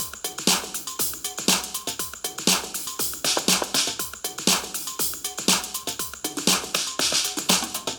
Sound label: Tops, 120